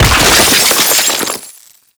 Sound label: Column Destruction; Gameplay; Sentient; Warframe; Tau; Destructible Dynamic